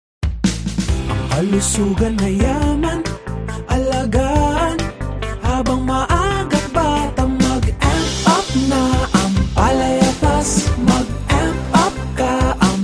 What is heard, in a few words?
jingle